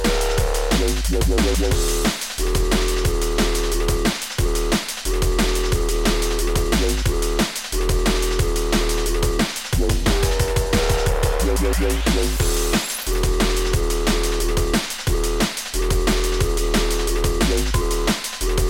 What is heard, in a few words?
flute tune